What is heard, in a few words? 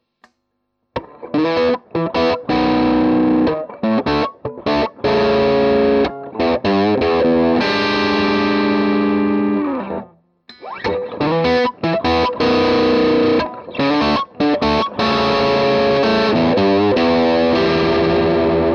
Gain Plus Boost